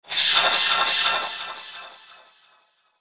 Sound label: Whoosh Pulse Shimmer Delay; Blastwave